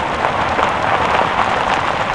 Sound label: DIRT; CD Action